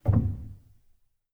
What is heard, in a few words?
rel, Grand Piano; bitKlavier Grand; Piano Bar